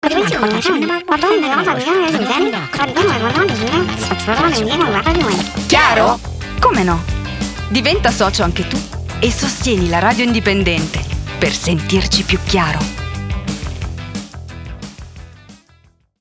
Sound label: Jingle